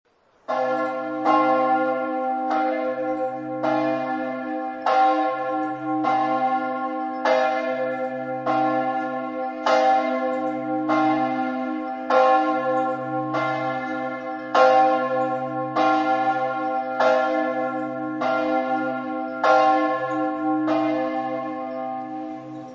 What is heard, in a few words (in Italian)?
campane; villesse